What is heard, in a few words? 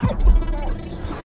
punch right slow down super hard; Pitch Black - A Detective's Demise